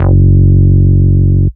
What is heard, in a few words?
Ultimate Bass Root F#; Vengeance Samples